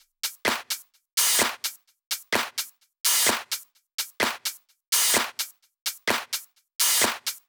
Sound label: Loops 128BPM, Drumloops